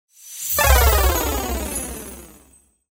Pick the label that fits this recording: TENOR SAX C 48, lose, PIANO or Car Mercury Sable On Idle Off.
lose